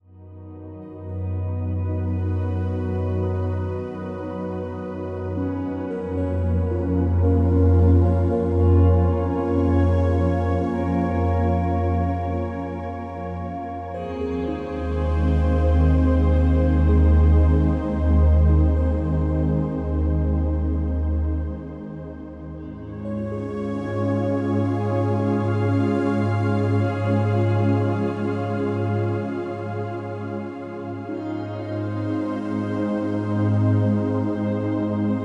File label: Relaxing Music